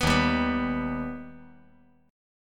Eb Augmented Eb; strummed; JGuitar

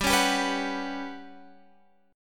G Major 7th Flat 5th, Guitar Open F; GM7b5; strummed

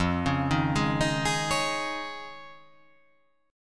F Minor 7th Sharp 5th

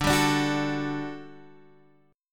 G Major 7th Suspended 2nd D

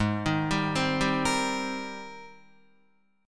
Ab Major 9th Ab down Guitar 4E 4A 5D 5G 5C 6F; AbM9; arpeggiated

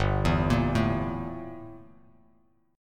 G Minor 6th Add 9th G down Bass Standard; Gm6add9 arpeggiated; JGuitar

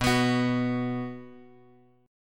B 5th B, chord; B5 Chord {X 2 X X 0 2} strummed

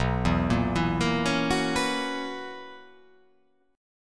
B Major 7th Suspended 2nd Suspended 4th B

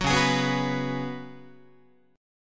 C sharp 7th Suspended 4th C sharp, Guitar; C#7sus4; strummed; JGuitar

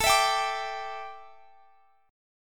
A 7th Suspended 4th, chord; A7sus4 strummed

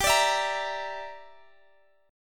A sharp 6th Flat 5th, guitar chord

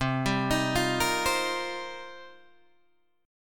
C Minor 11th C Guitar DADGAD; Cm11 arpeggiated

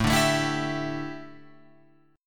A 7th Suspended 4th, A, down, Guitar, Open F; A7sus4 strummed

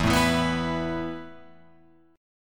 E Suspended 4th E, down, Guitar, Open F; Esus4 strummed

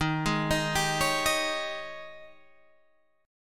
Ab Major 7th Suspended 4th Eb

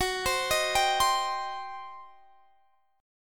G Major 7th Suspended 4th Sharp 5th Gb

